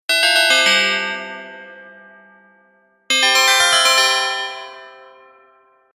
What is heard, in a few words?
klang n bells